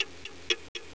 Clock; qlat2

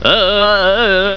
pain; butthead

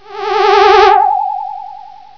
Gate; IQ2 Demo

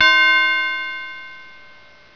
CHIME A; Chimes Bells

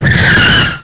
skid

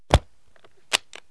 brief, office, equip; KABOOM! - Wild and Wacky Sound Software

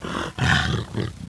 growl

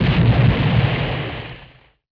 boom; BESTSHOT; noisybit

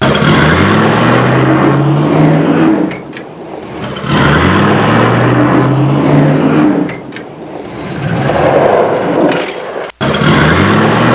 car shift; english; vehicles; Pinnacle

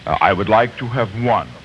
fluty

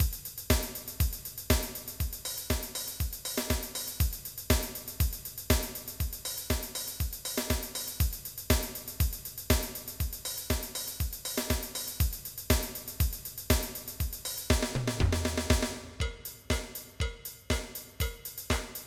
Disco

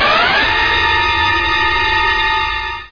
thwing

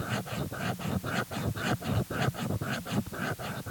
Scratch

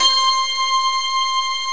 standing ovation

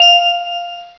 Music Box F5; Star Trek JR Audio

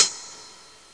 Simbal

